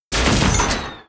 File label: PEACE DAMAGE; Mob